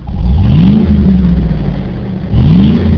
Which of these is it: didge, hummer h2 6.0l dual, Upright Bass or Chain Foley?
hummer h2 6.0l dual